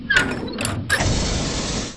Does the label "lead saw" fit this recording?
No